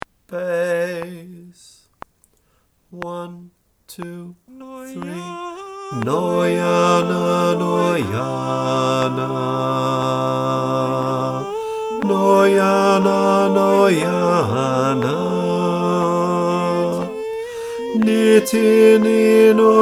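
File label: bass click track, choir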